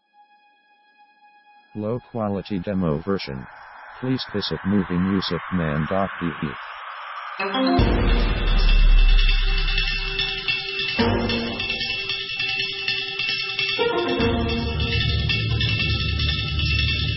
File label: orc mellow action